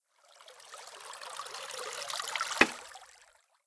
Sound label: Shishi Odoshi; blue archive